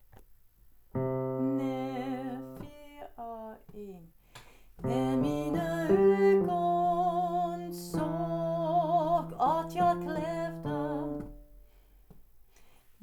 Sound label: TENOR